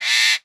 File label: bird parrot squawk; A Dump in the Dark; SFX bird parrot squawk